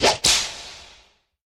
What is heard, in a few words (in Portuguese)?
chicotada efeito sonoro